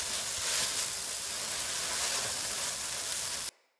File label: stag blinding light enemy loop; Powers; Stag Pact